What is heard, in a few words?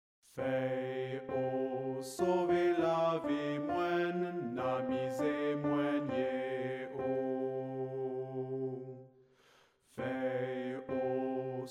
Fey ALTO